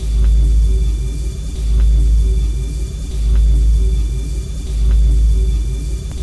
ATMOSFX; Best Service; Advanced Mega Effects

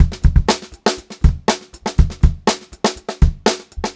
121 FK BEAT L, Funk-Groove Drumloops; USB Soundscan vol.38; AKAI; FUNKY

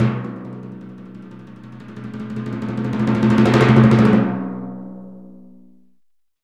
PRC TIMP, Timp Cresc, Orchestral Perc; Roland LCDP03

